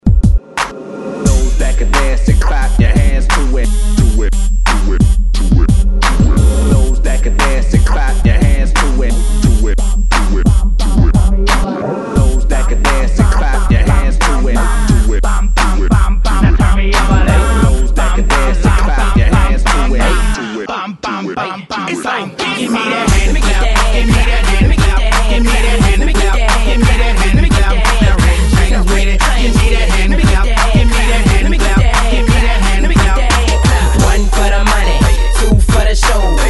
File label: H Clap